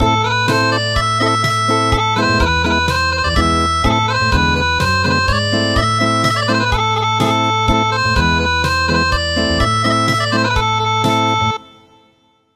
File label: Acoustic; traditional; Why why you did not come